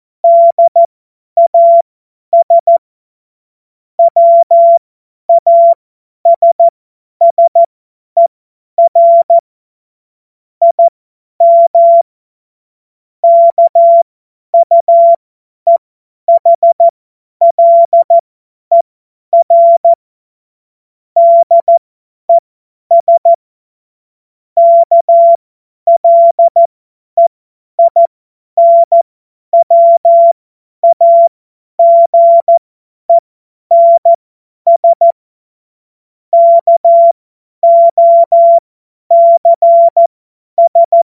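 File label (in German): Witz, Morsekurs, cw morsen; Witze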